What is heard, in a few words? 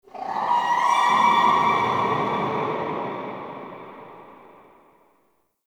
Growly Overtone; Ambience; Warframe; Shadowgrapher